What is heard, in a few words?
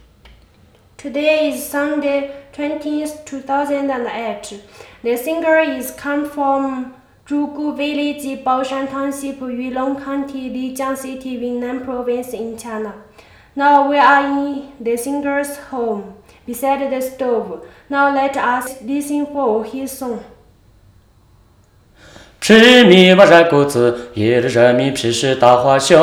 Wangjiagou Drinking Song